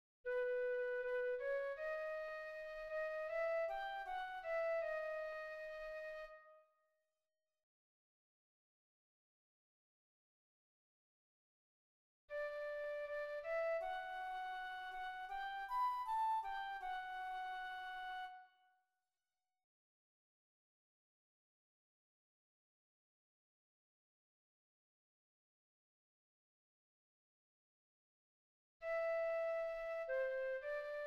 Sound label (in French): ANAMNESE FLUTE